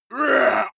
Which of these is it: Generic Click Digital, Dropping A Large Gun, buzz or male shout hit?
male shout hit